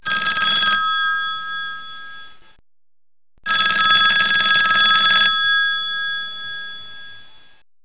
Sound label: Phone Tring